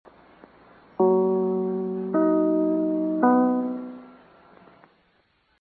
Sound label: NBC Chimes